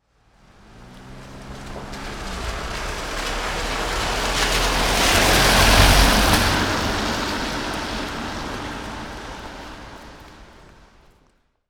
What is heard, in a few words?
wet road slow car passing; MM Blazers GFX